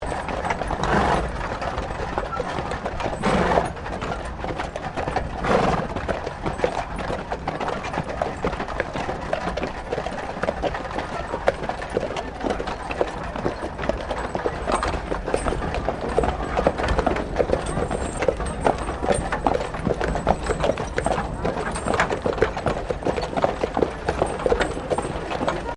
Wagon Horsedrawn 4 Horse Medium Uphill; Carriage Sound Effects; Carriages (Sound Effects:Vehicles)

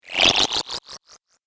Cartoon Magic Spell

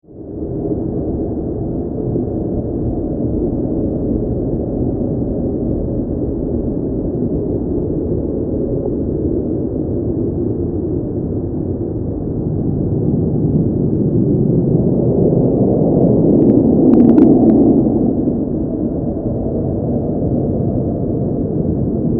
Wind Ghostly Gusts, Horror; Wind, Ghostly, Gusts, Horror, 5.1

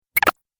Arcade Game Digital Blurps; Video Game Sound Effects; Retro Inspired Sound Effects - Think Nintendo, Atari, C64

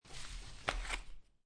Playing Cards Gather Cards From Table; Card Sound Effects; Cards (Sound Effects:Casinos)

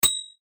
chime metallic, Chime Metallic Shine; Chime Metallic Shine Production Element Imaging Element Accent Transition; Multimedia; Blastwave

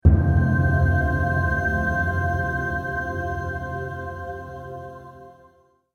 Angelic Drum Impact; Miscellaneous Impact Sound Effects

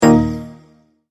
Multimedia Tone-Stop

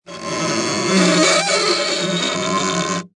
Cartoon Guitar String Scrape, Stretching, pulling; Comedy/Cartoon; big squeak

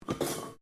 METAL CRASH SMALL

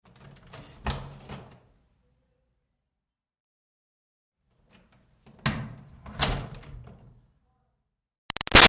Door Wood Church Basement O-C Lite; Miscellaneous Doors; Big Room Sound

